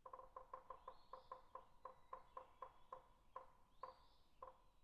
woodpecker pecking on tree

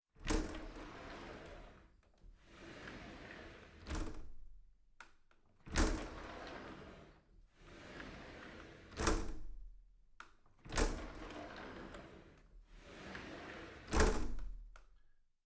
sliding window series

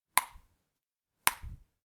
ping pong ball hit

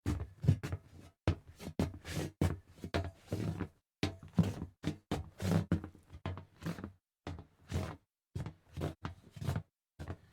walking over hollow metal

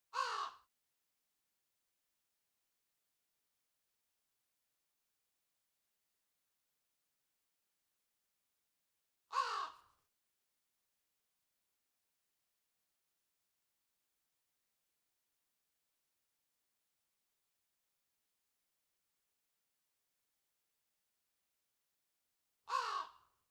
crow call

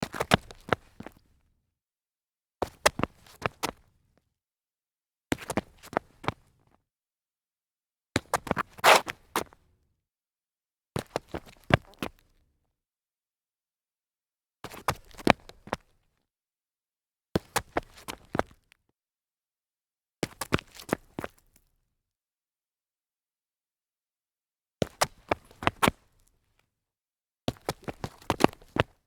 sneaker stumble concrete, foley

